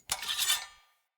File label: Player Short Sword Equip; Weapons; Swords